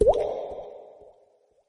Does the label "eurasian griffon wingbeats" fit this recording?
No